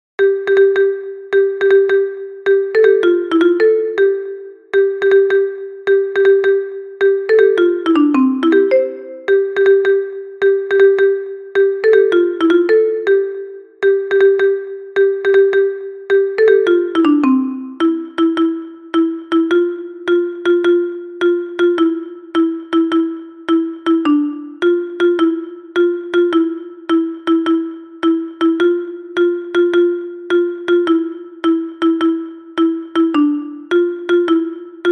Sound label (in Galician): Xilofono Contralto